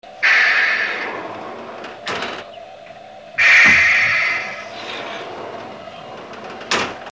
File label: sky Hatch